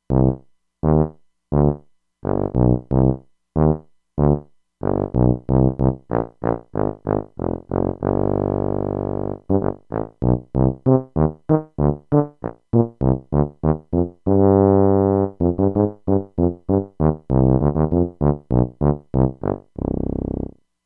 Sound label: Tuba; Factory Presets; E-mu Proteus-2